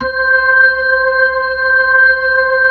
B-3 Chorus

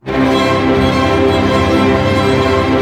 ARP C, STR ARP MAJ; Sound On Sound - Peter Siedleczek's Orchestra

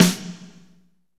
big band fast; Roland L-CDC-01

